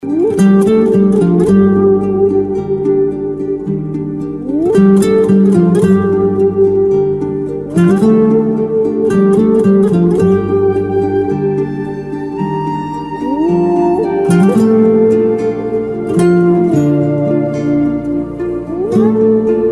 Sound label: Rington Turkey; Sad